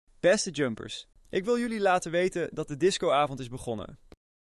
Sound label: Jump Disco